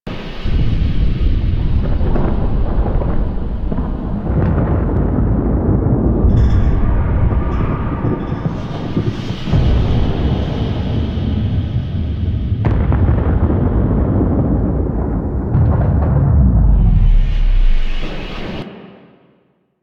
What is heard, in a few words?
Sun Devourer Ambient Bed Loop; Warframe; The New War